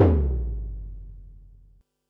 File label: Bassdrum, Pearl SC-40